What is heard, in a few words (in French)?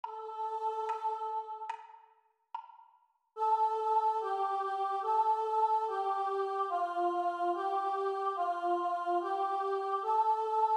Chant Vx Seule